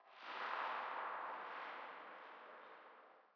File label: cave, ambient; HunterZ G4; minecraft